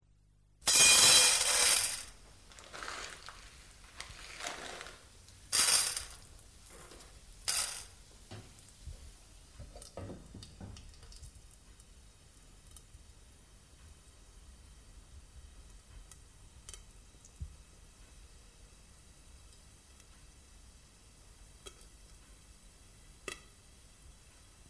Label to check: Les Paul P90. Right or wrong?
Wrong